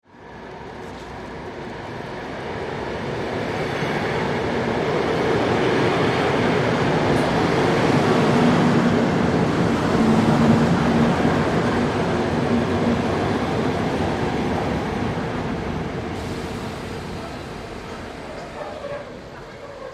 Train Electric Up and Stop Door Slams then Departs